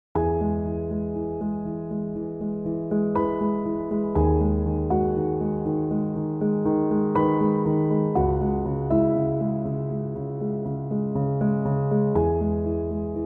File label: chill piano instrumental